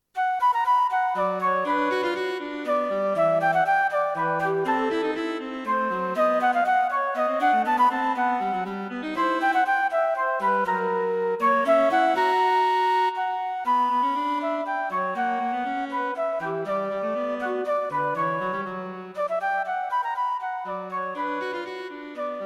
Flute and Cello Duets

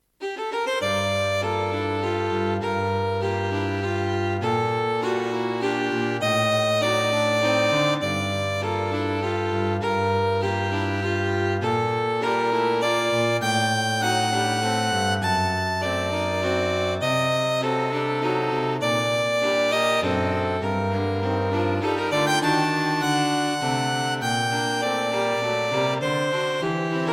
A gentle,night-time piece for String Quartet; Flowing along at a leisurely pace.; Not too difficult.

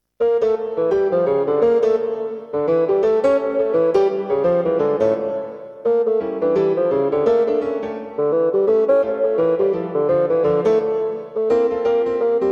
Bassoon and Piano; Quite difficult if played at speed.

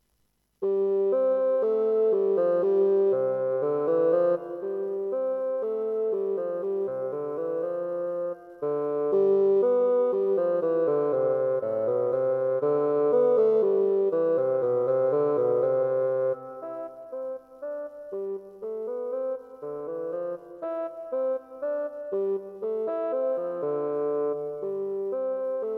Unaccompanied Bassoon Solos; Good starter pieces for the Bassoon.

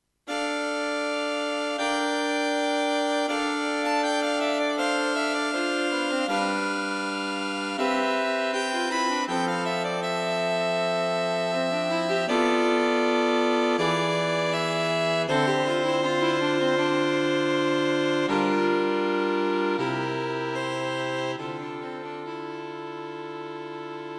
String Quartet for Concert performance; This piece has a calming effect.; Played slowly to take in the warmth of the harmonic changes.; Written for String quartet

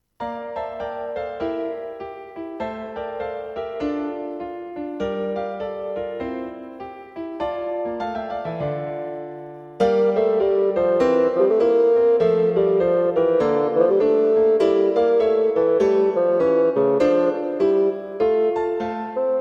A duet for Basso; A duet for Bassoon and Piano.

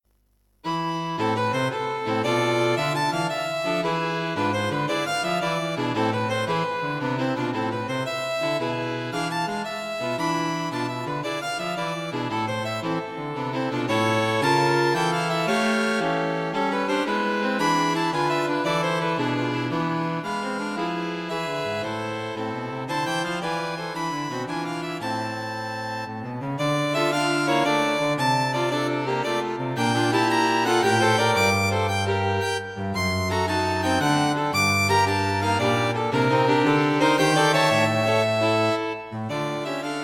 String Quartet for Concert performance; This piece is written in 9/8 with a definite three-in-a-bar feel.; Nine is the important number in the game of Baccarat.

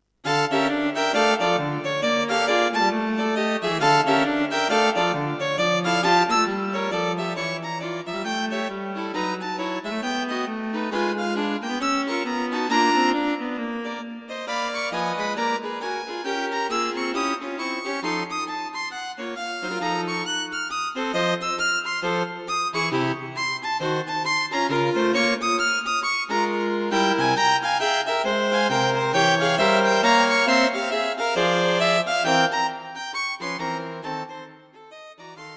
String Quartet for Concert performance; A fast piece with plenty of jazz rhythms and modern harmonies.

